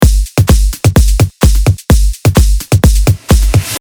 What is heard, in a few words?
Mainroom House Full Loop